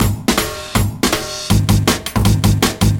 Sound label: loop